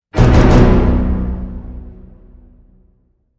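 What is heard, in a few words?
Hit Orchestra Tutti Triple B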